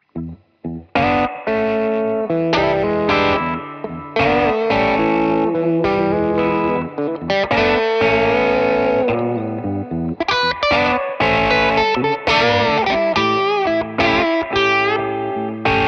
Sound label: blues lespaul legion; poulin